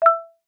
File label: hit